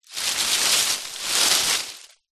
palm tree branch drag forest floor; zapsplat

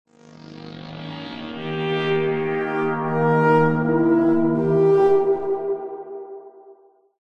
synth space weird synthy sci fi melody accent; Jessey Drake

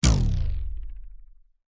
cartoon cord stretch elastic; zapsplat

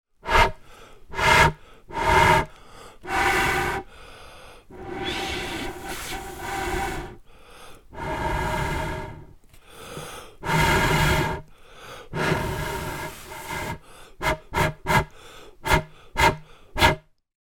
foley blowing air top of plastic pipe

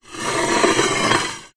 metal scrape rub drag on metal smooth; foley

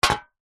food drink soda can empty set down wood deck; zapsplat

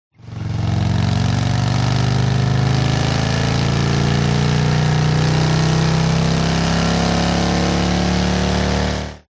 TRANSPORTATION MOTORCYCLE Harley Accelerate Long

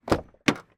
transportation car door open external

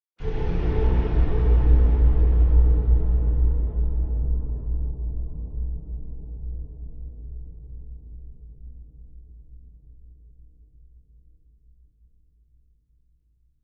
sound design cinematic hit dark heavy scary; zapsplat

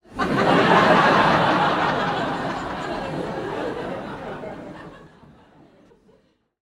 human audience laughter comedy club x200 people; komedia brighton comic boom

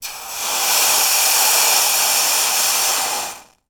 water sizzle hot knife place in water; sound effects one

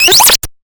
sound design glitch or shut down

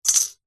office ruler metal set down concrete; zapsplat

